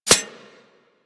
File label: Arrow Impact Metal; Warframe; Weapons